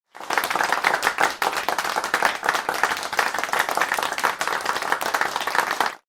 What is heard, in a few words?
medium applause; SFX; DailySounds